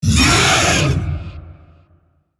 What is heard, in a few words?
Vocal Pain; Warframe; Zariman; Void Angel